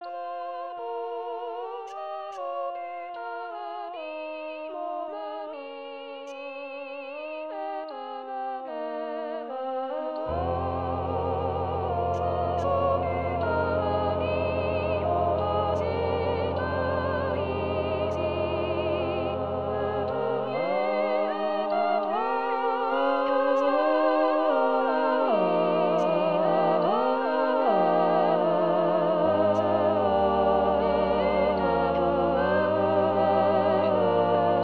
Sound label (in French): Bas solo Trois-beaux-oiseaux; Ravel